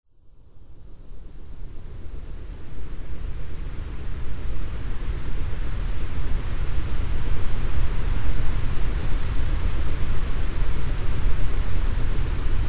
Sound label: drone 8hz